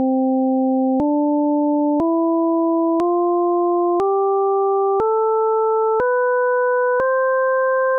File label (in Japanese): ピタゴラス音律のドレミ; 実際に平均律と純正律の音の違いを体験してみましょう。; classical music